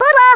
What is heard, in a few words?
missed; Polish